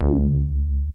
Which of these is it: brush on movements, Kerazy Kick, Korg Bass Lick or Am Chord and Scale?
Korg Bass Lick